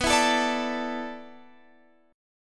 D Suspended 2nd B; Dsus2/B; strummed

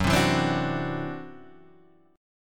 E 7th Suspended 2nd Sharp 5th Gb, guitar

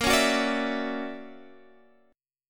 D Augmented Major 9th A sharp, guitar

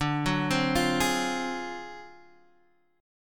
D 7th Sharp 9th Flat 5th; 10,9,10,10,9,x; arpeggiated; JGuitar

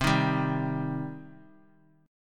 C Minor C down; strummed; JGuitar

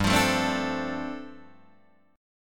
G 9th Suspended 4th, Guitar DADGAD

